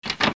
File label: Latch